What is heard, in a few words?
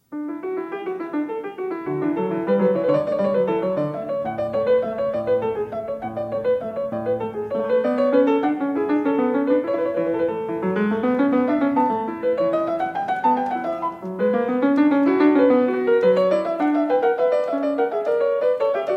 Two part invention for keyboard No. 3 in D major, BWV774; Klassika; Bach, Johann Sebastian